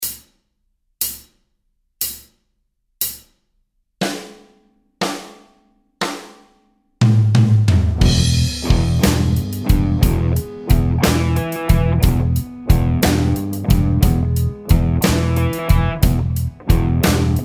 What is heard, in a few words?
slide backing, guitar